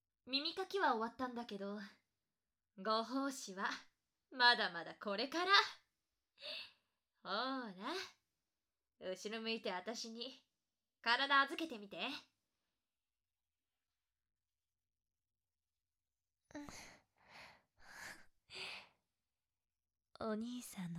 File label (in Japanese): 両耳耳舐め BGM無; シベリアンハスキーの新人耳かき師・颯